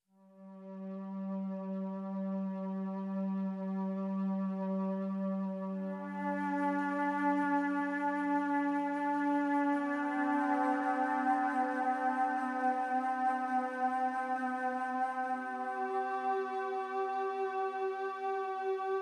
432 hz

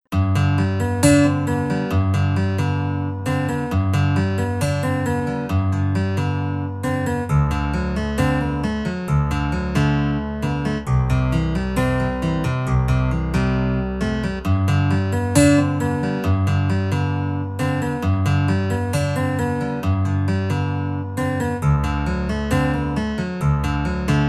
hawaiian guitar, 432 Hz; wet hair; Mokre włosy; Grota solna

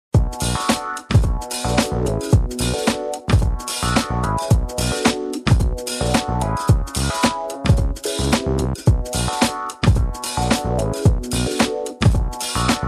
Rhythmical Funk Vibes

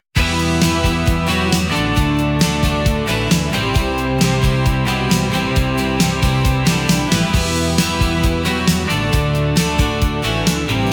jangle pop

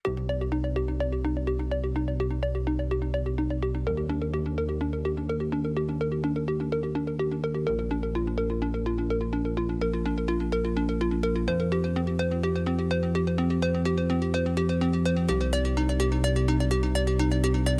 Electronic Impulse

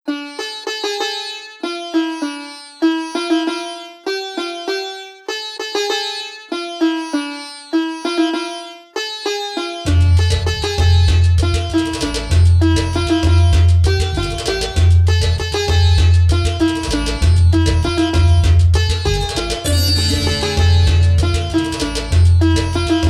sensual rhythm 432 hz; passion; Namiętność

